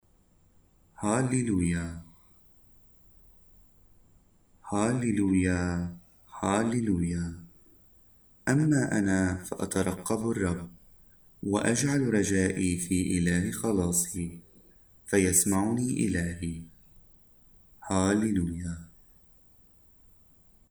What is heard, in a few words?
Halleluiah, arabic mass